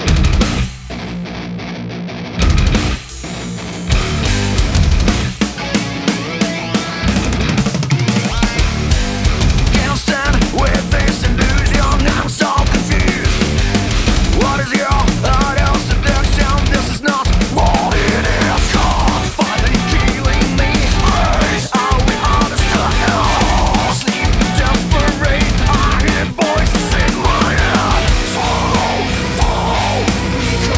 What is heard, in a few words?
Corporate